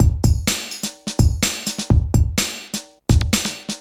126 bpm drum loop e key, kick tuned to the E note

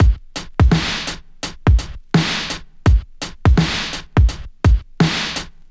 92 bpm 00s drum groove a key; drum loop

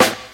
juicy snare one shot c key; Duration 0:01

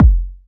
kick drum f sharp key; kick drum samples

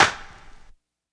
prominent acoustic snare sample b key

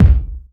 smooth bottom end steel kick drum sample C key

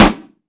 Boom Snare Drum D Key, acoustic snare

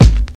00s original kick single hit g sharp key; kick drum samples

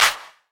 clap sound c sharp key; single hits one shots

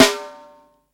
airy snare drum sample d key; snare drum samples

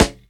dry acoustic snare g sharp key

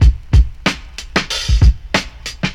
94 bpm drum groove b key; drum loops

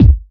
rich lows bass drum single shot c sharp key; kick

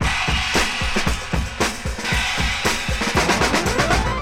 114 bpm breakbeat f key, kick tuned to the F note

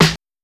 clear steel snare drum f sharp key

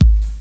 good bass drum sound G sharp key; Duration 0:01; Mono; kick drum samples

